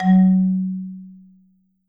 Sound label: F#2, Marimba; hard F; Sampleheads - Dave Samuels Marimba & Vibes